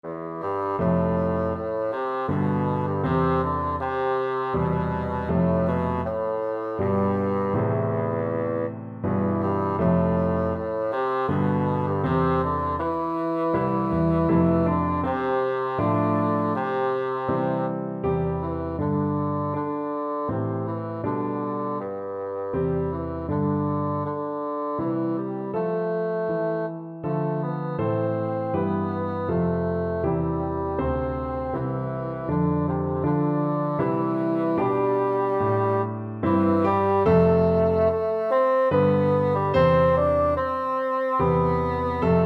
holst jupiter, bassoon

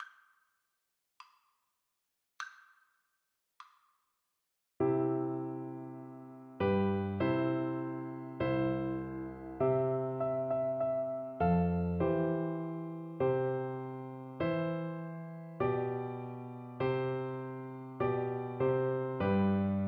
Masterpiece Theater flute

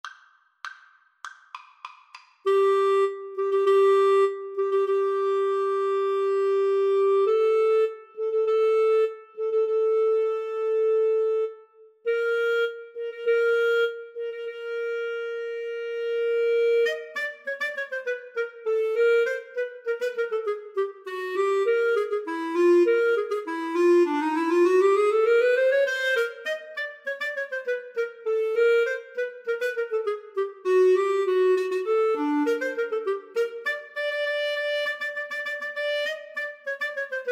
Flute Clarinet Duet; Fucik Entry of the Gladiators

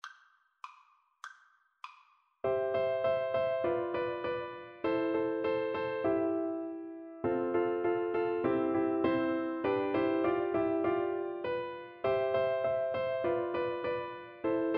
Piano Duet

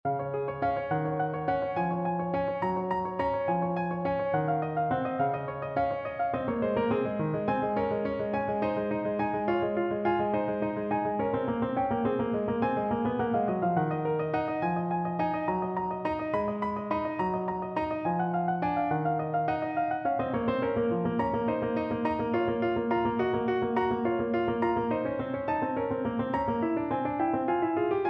Prelude, piano

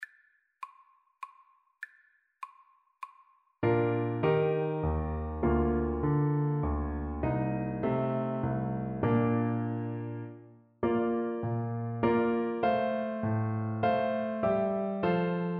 sarabande BN, Bassoon

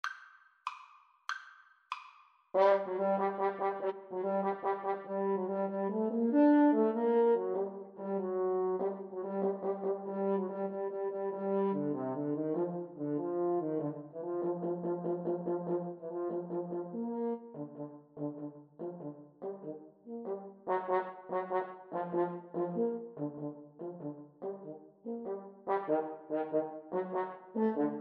French Horn Duet; Elgar Serenade

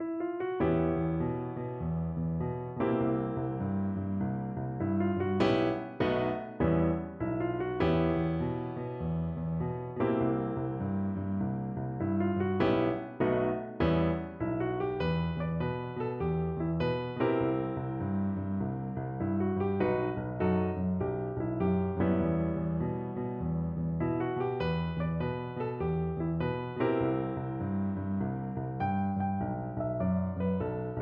minor piano

